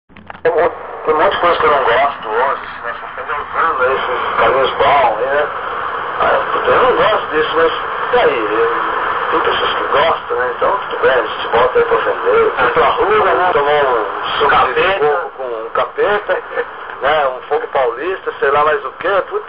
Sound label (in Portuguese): reggae da bahia